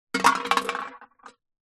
impacts metal water bottle flask crushed drop ground